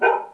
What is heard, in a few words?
Bark